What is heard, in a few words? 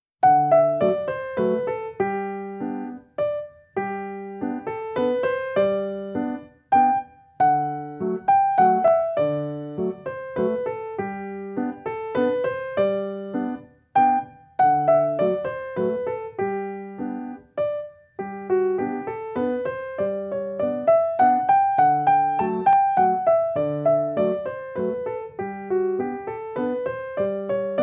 slow waltz